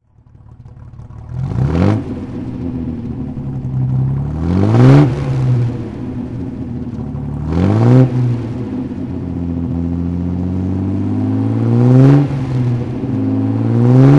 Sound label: austin healey 3000 mk2 3carb throttle